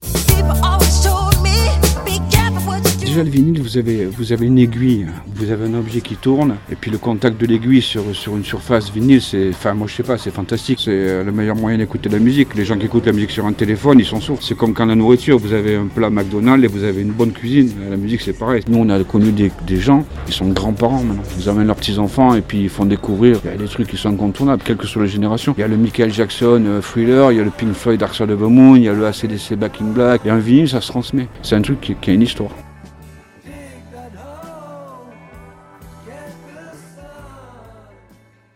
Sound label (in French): son vinyle musique